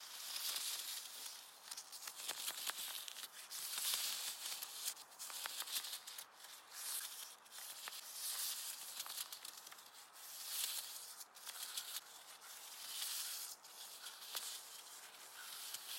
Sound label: fence